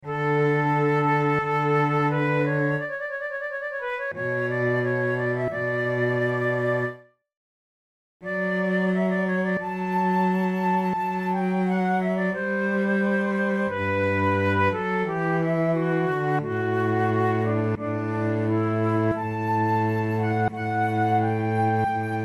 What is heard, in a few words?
flute sonatas largo; locatelli